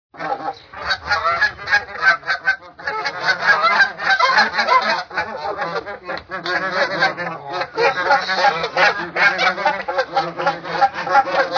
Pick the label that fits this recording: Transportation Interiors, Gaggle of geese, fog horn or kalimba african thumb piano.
Gaggle of geese